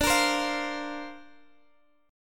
D-Minor 7th Sharp 5th D Guitar Open G, chord

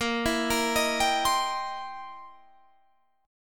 C 7th Sharp 9th Bb

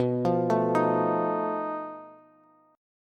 B 7th Flat 5th B Bass; B7b5 arpeggiated; JGuitar

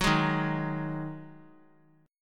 D sharp Minor Double Flat 5th; strummed; chord

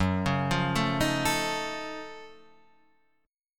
F sharp Minor Major 13th, Guitar, Standard; arpeggiated

